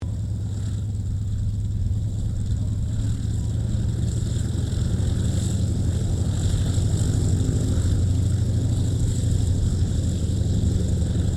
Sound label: AIRCRAFT CAC WIRRAWAY PREFLIGHT; CA-16 Wirraway; Airplanes; Vehicles